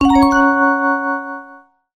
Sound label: special Alert